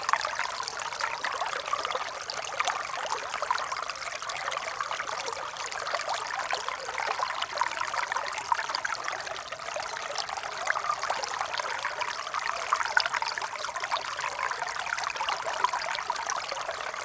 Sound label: Stream Water Close